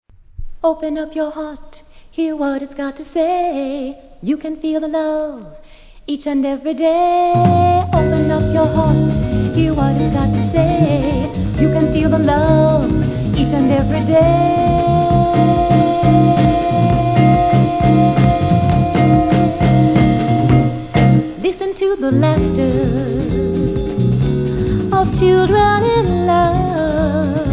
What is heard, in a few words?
jazz